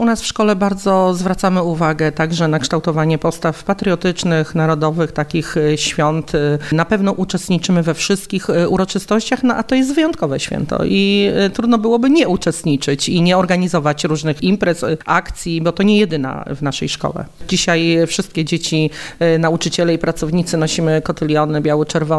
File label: hymn